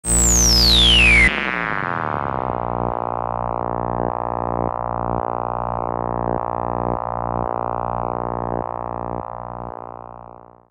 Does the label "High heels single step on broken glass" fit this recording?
No